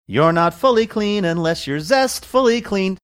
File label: singing youre not fully clean unless youre zestfully clean